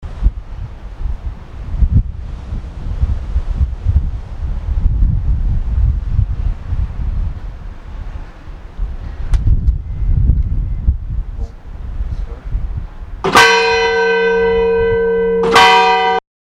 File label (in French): cloche; Chavanat